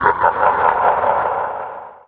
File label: game over; Undercroft